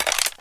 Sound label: smg clip in; weapons; source engine; left4dead2